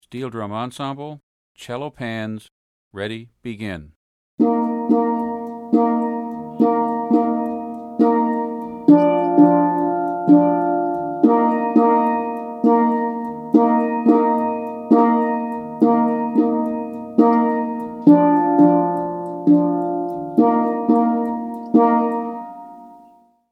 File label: Cello